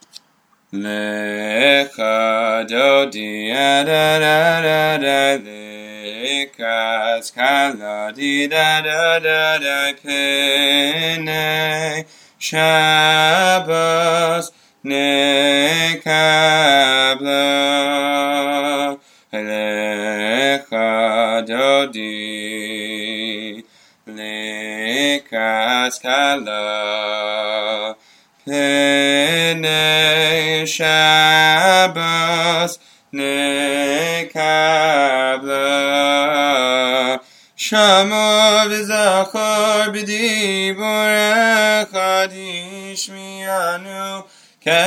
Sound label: Lcha Dodi Classic Melody